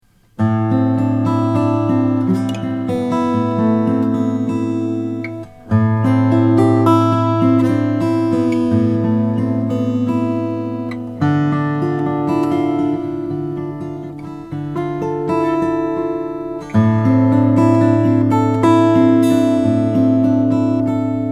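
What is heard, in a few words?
maingard gc cutaway brazilian rosewood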